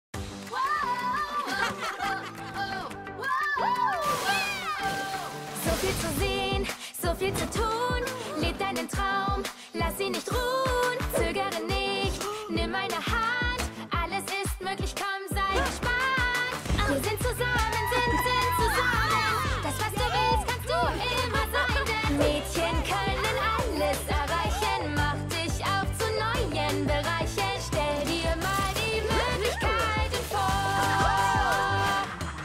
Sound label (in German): Karaoke; Barbie Traumvilla Abenteuer; Barbie Deutsch